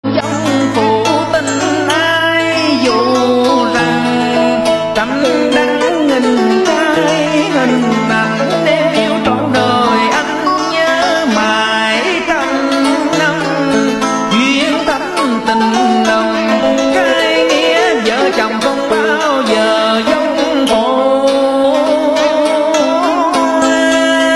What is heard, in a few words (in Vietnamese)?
Liên Khúc Vọng Cổ; Dài Hơi Đặc Sắc Nhất Phần 1